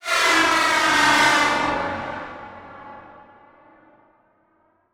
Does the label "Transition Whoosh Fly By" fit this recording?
Yes